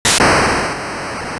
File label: explosion; famiconse